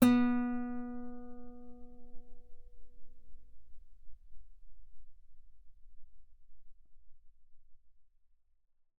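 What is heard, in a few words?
Guitar fortissimo sul B B3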